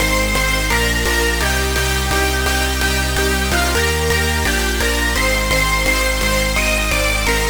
House Drop Loop - Progressive House - 128 BPM C Min, Supersaw; Synths